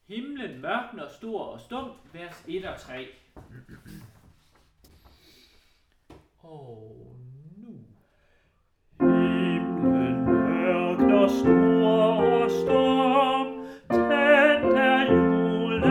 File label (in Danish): sopran, koret; Himlen mørkner; harlev koret